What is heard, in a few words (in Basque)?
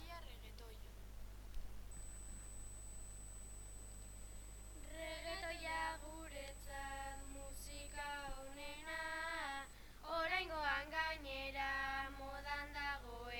pop musika